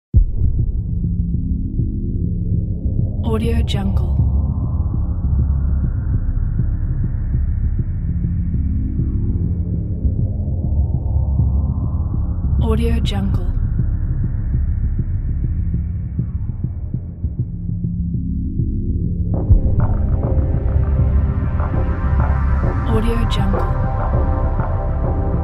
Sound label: drone deep